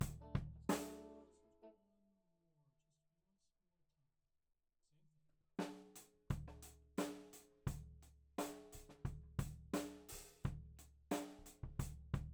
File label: Floor